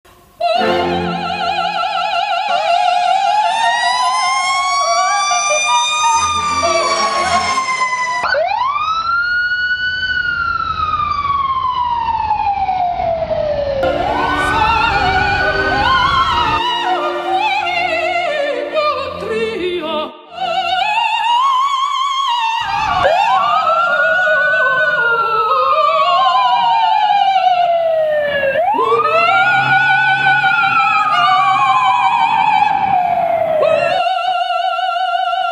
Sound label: doppler effect opera; sirens; Siren musings 2014